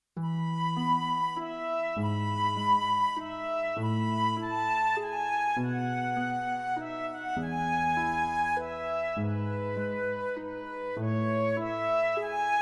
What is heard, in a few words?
lullaby